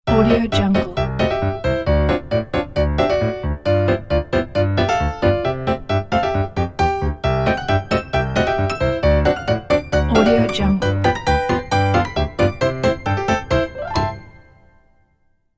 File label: perky piano ident